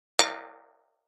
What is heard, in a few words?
Pop Up Elect Tap Rever, pop up element